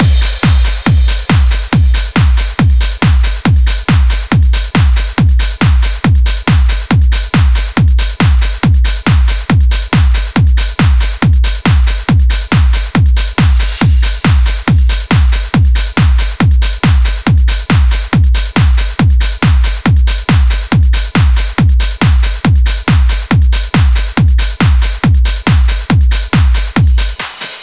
139BPM Drum Mix 16 bars; Drums & Perc; G#minor

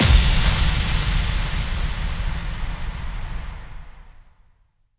Bassdrums FX, FX Kicks; Vengeance Samples

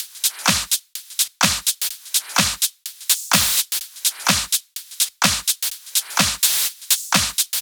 126BPM Kit; Netherworld Kit; Vengeance Samples

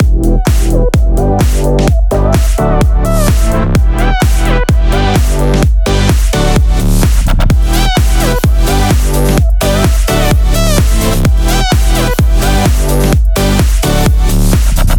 Sunshine Mix, 128BPM; Vengeance Samples; Sunshine Kit

